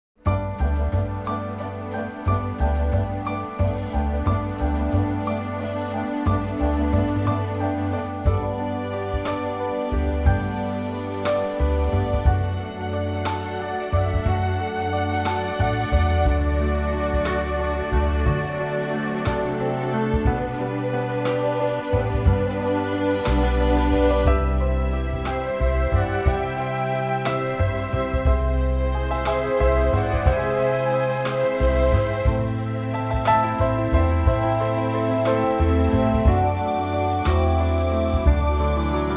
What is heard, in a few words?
Orchestral G Ab A